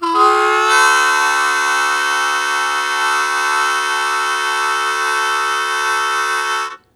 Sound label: Blues Chord Attack D1, Harmonica; Zero G - Harmonica